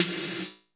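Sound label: gate snare 808, gate collect; Masterbits - TR-Minator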